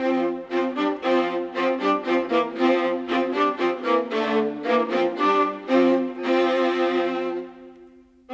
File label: Viola, Orchestral; Sonic Foundry (Sony Creative Software); Orchestral VOL-2; Modern 31